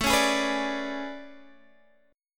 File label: A Major 11th, down Guitar Open D